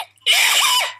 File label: Sneezing